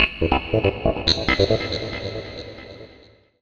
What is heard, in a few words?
TECHNO EXTRA 140